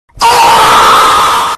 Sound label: emoji screaming and disapearing; Memes